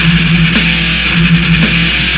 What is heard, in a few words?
metal